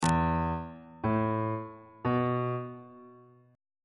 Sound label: e suspended 4th triad chord intervals